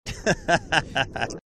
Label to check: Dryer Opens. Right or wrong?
Wrong